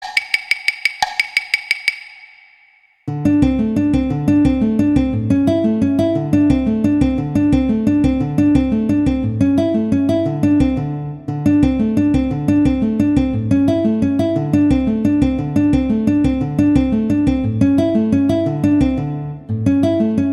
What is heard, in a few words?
Allegro Violin; Paganini; Sonatas Op1 Vl Gu; Sonata No 3